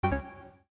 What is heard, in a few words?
error